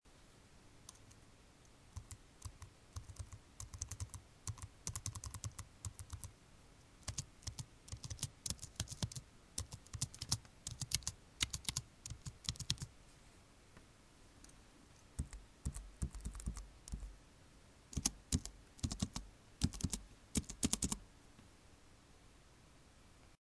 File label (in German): tastaturanschlag